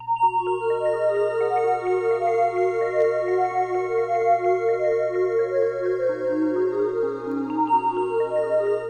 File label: AMBIENT, SYNTH SEQ; AMG; Global Trance Mission VOL-2